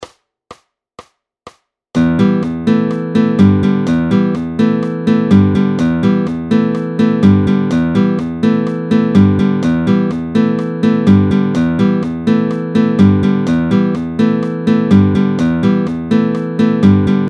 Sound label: Gimmick tablature